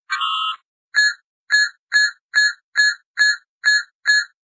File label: Doors Failing